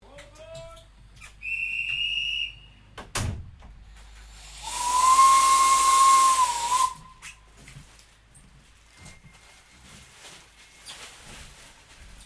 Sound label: Train Steam Locamotive Kraus 0-2-0 Carriage Interior; 1906